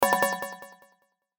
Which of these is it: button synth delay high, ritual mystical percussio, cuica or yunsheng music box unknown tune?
button synth delay high